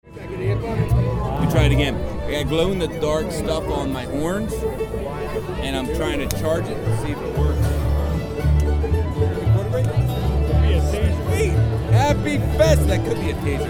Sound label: Glow Horns Dulcimer